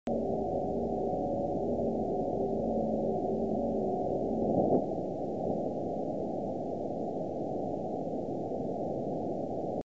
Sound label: 160 Noise